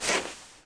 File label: pl snow light, player; cstrike